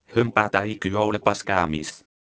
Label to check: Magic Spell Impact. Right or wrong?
Wrong